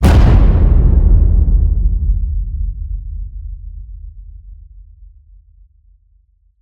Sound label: HIT Epic Drums, Cinematic Hits Designed